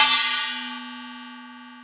cymbal; 0Dash

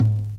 Closed Hats

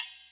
Percs

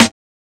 Snares

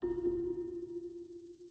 Lies FX

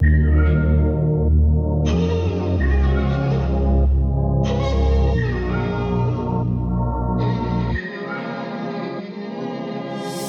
soul BPM 93

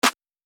Clap

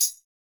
Perc; SwizzBeatz

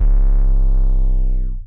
Alien Dist 808; 808s

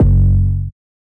808 - F#; Cymatics

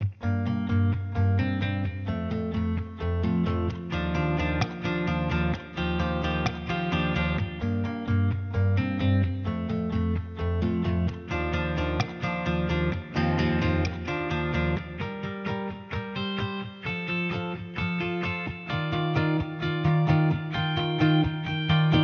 gtr 130bpm; dumb but rich

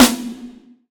snare big; Snares

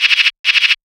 shaker fill 135

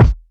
Kick

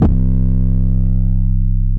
808s; TMFool808

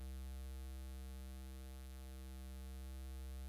Synth

